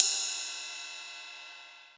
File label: ride, Yamaha TG-500